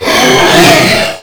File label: Attack Scream; Warframe; Stalker